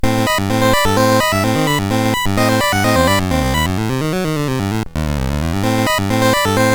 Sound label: Funk, sid CSG8580R5; hvsc